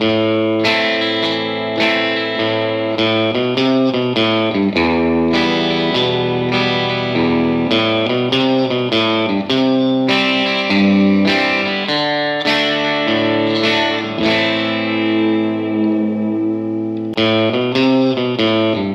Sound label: guitar westerns, Loops